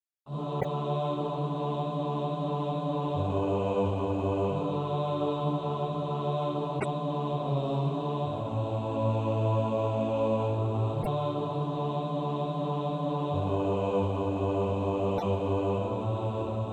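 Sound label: bass; He Hideth My Soul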